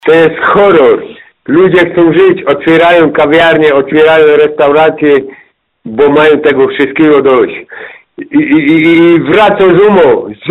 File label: horror